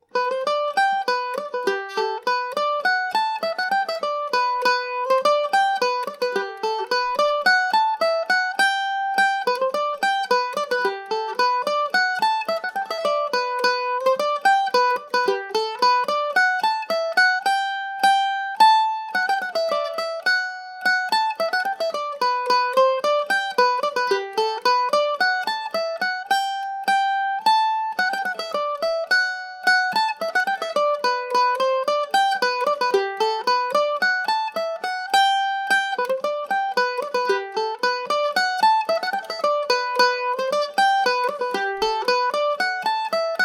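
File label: polka G major, Irish mandolin; din tarrants polka no 2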